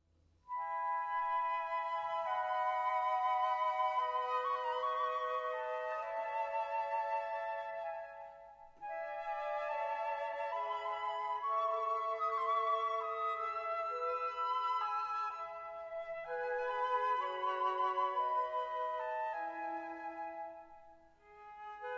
Woodwind Family; Instruments of the Orchestra

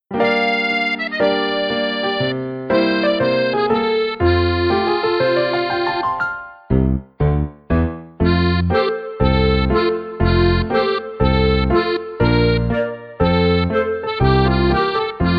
danish dance

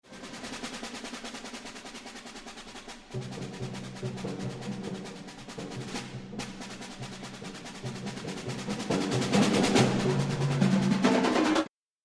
Percussion Family; Instruments of the Orchestra

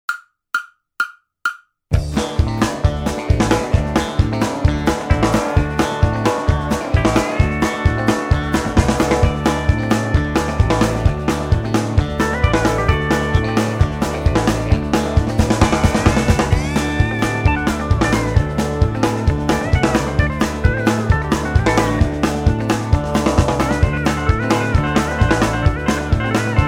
132 BPM 32 bar Hoedown

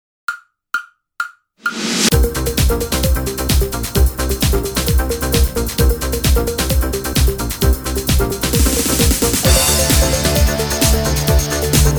131 BPM 32 bar Dance Party

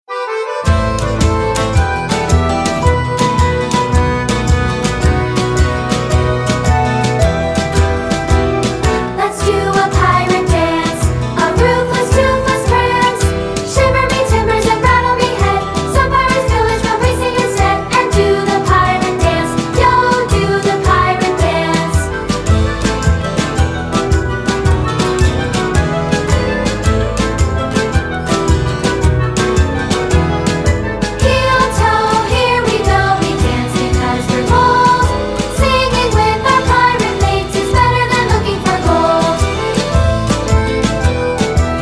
The Pirate Dance Vocals

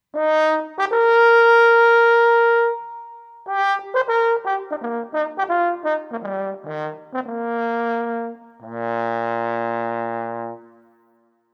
french horn

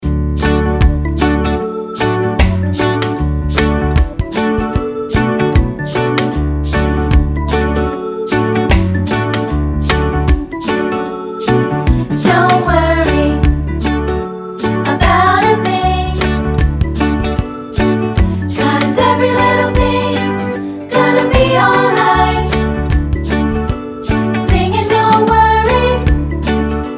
Vocals

